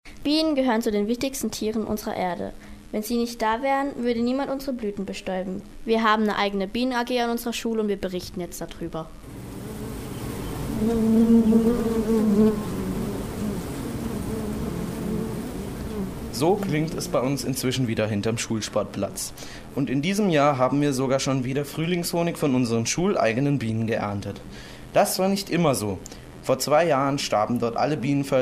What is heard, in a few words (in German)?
Bienen lang; Gymnasium Kenzingen